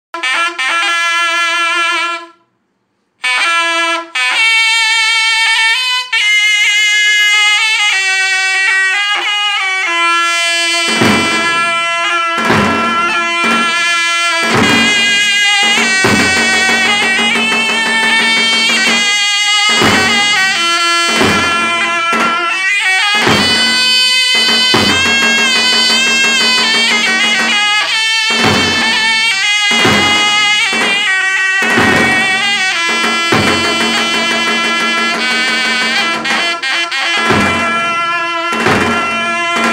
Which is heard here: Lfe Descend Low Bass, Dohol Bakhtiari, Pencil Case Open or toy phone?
Dohol Bakhtiari